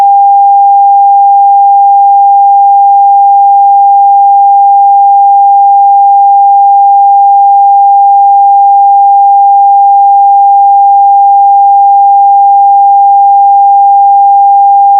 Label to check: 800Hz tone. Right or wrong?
Right